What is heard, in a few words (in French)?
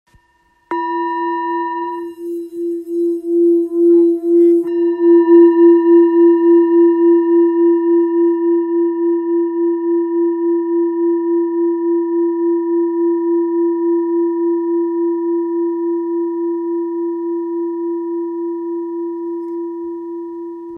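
Bol ancien fa, bols chantants tibétains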